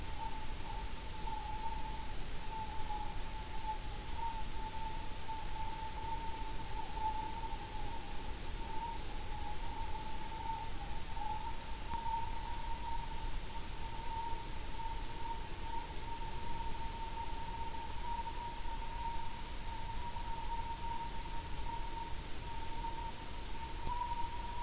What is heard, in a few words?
WFR audio emfisis